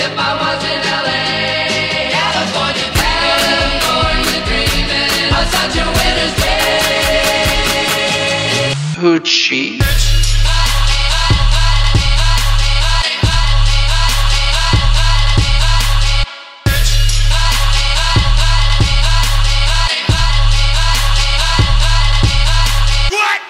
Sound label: Trap Brown Leaves